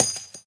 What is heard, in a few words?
Shell heavy dirt; STALKER; IWP